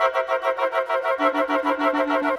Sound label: Winds, Orchestral; Sonic Foundry (Sony Creative Software); Orchestral VOL-2; Modern 22